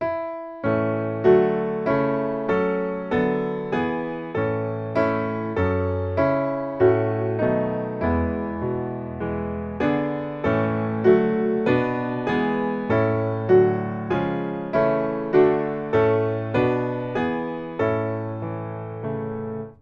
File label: piano